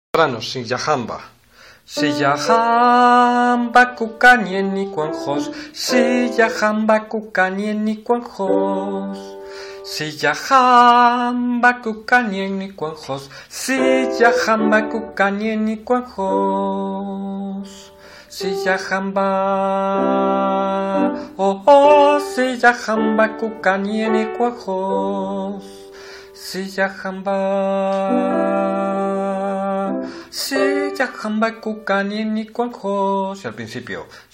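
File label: soprano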